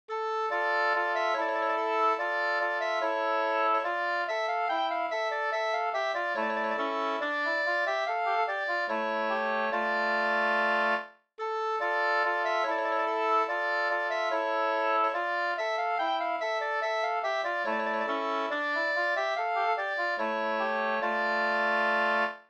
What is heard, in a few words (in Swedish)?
skanklat